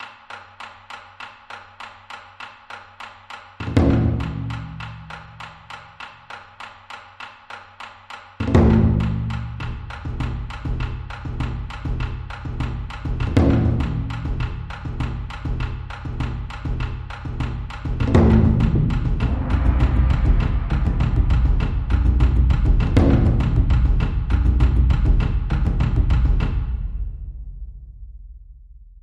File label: East Asian Drum